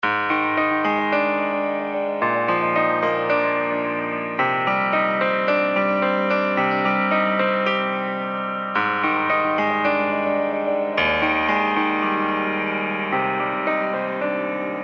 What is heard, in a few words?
gothic piano